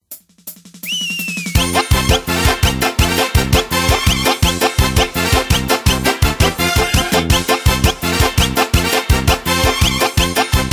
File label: Ukrainskaya polka; ukraina